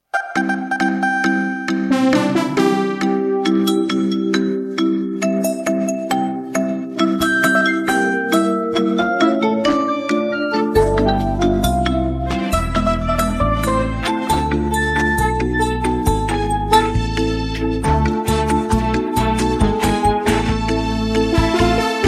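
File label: backing track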